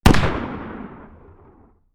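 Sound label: magnum exterior shot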